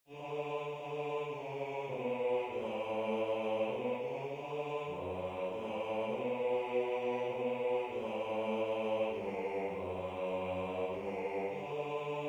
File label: Bass